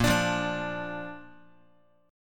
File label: A sharp Major, Guitar; strummed; JGuitar